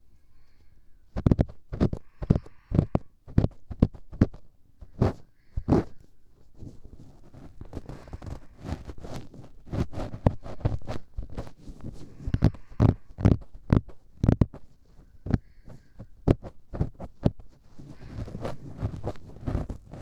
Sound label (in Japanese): 左耳・耳かき・梵天ロング